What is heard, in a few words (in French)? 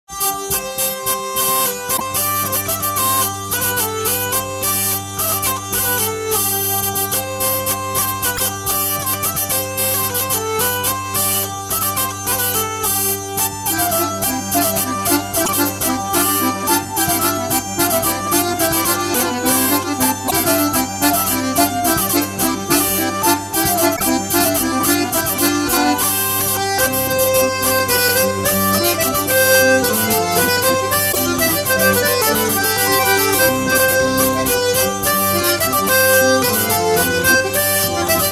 Bourree valsee; collectage Pierre Goffard